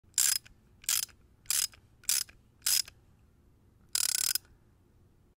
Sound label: ASMR Satisfying Sounds RATCHET SOCKET Tool